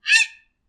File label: heron, animals